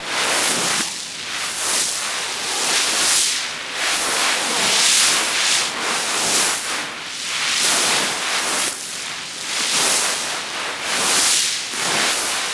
RAPIDS; Spectrasonic Distorted Reality 1; MENTALRAPIDS

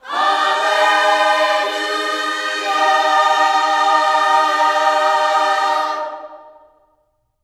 HALLELU, Gospel; HALLELUJAH

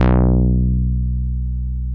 MOOG1 BASS B1

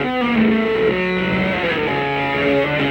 Slip Down, Electric Guitar; Sonic Foundry (Sony Creative Software); Friendly Fire; Serpent

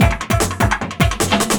LOOP, 150 BPM; Ueberschall; Drum & Bass Flash Trackz

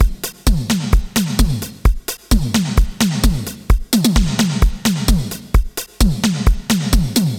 Beat Ninja Star; Zero-G; Future Wave; Beats

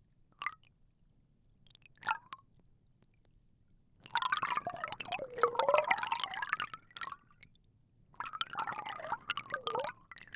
Hydrophone, Underwater, Gargle; underground sounds

